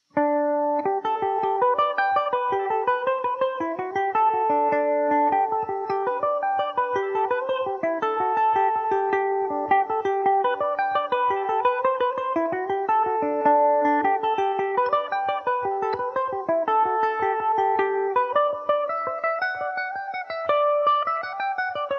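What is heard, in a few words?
G major, Irish mandolin; Chris Thile plectrum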